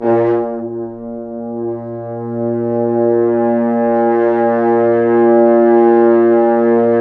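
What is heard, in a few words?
French Horns sfz; Roland LCDP06 Brass Sections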